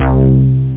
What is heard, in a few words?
fun bass; OctaMED SoundStudio V1; synth-chords